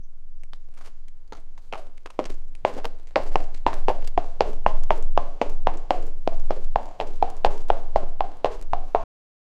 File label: horse steps, hooves